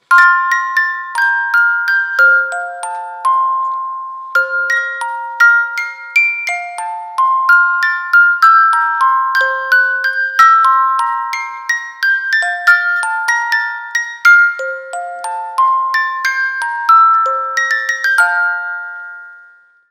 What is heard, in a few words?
Lullaby; Mozarts Lullaby